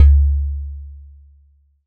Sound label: Udu; resynthèses